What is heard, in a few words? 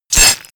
sword impact gore